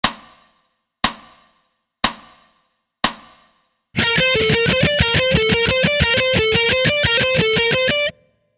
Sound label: Alt Picking Pattern C